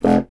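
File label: wind contrabassoon; Miroslav Vitous; Solo Instruments VOL-1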